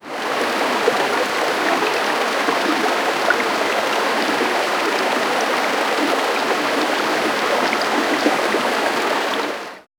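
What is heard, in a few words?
clear mountain stream flowing, river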